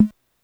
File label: YM2413 DRUMS, Sega Master System YM2413 Preset Instruments; LITTLE-SCALE; 8 Bit Samples Packs